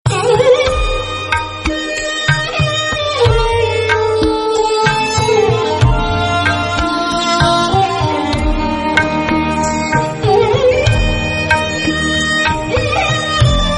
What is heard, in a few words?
sarangi, relaxing